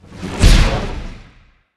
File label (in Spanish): sfx ataque electrico; thor